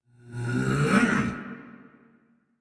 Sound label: Attack Effort; Warframe; Dax Heavy; en